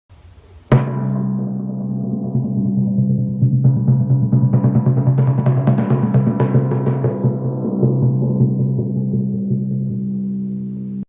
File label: Timpani Drum